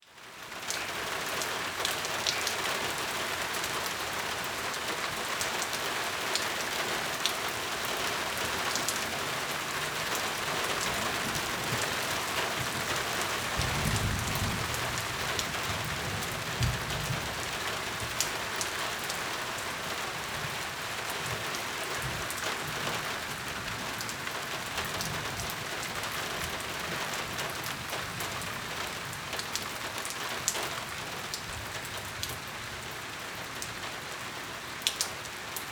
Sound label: Weather Ambience Rain Light To Heavy Splatty Awning Roof; AdobeCC